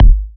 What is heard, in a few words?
usurp, Kicks Electro